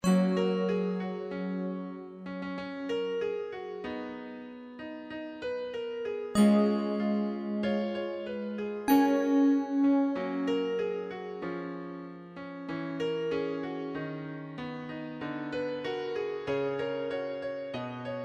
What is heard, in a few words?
jingle